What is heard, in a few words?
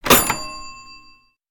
box of things